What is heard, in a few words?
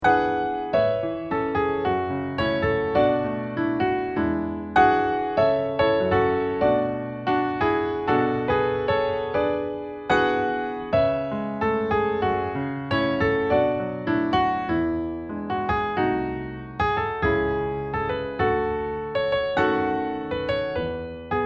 accompaniment